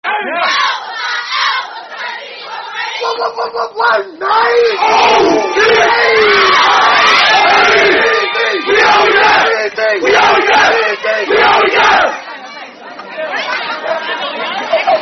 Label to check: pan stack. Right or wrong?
Wrong